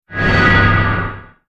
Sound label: soulburst effect